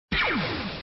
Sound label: blaster